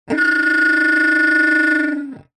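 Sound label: foley blowing air into pipe with squeaky mouth piece weird sound design element; zapsplat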